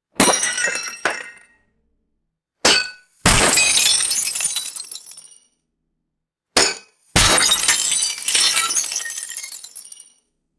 heavy glass smashing on concrete

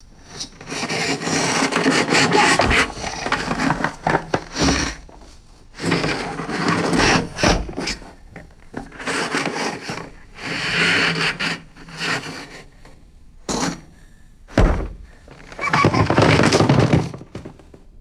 heavy wood shuffling in reverberant room

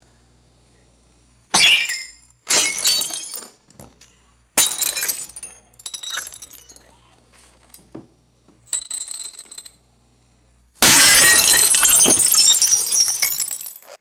glass objects shattering and shards scattering

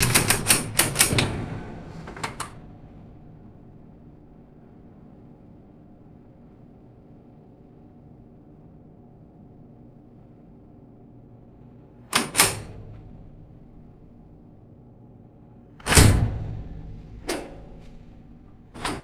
heavy galley oven switches clicking, boats

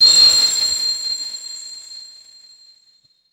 delicate high pitched synthetic twinkle

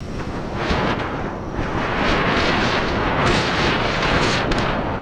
strong wind flapping a giant plastic sheet

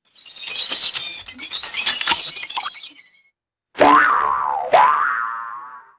cartoon metallic jangle and spring boing

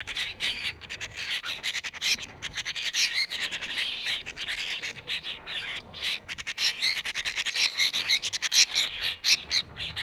group of apostlebirds chattering and squawking

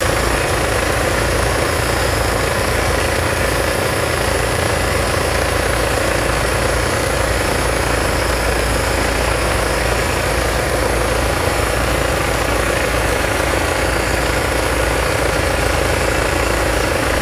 continuous churning motor idle rumble

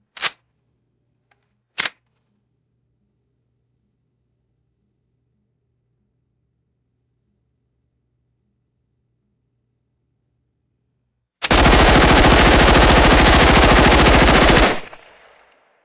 indoor submachine gun semi automatic burst

